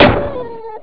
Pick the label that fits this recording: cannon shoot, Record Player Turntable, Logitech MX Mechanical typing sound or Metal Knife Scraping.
cannon shoot